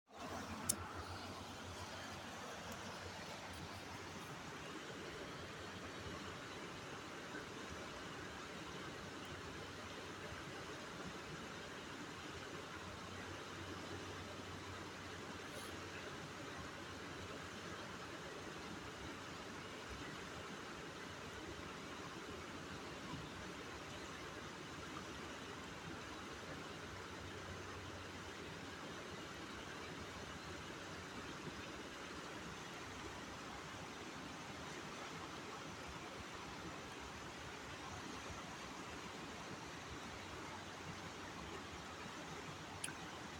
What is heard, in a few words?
falls sledding hill; berea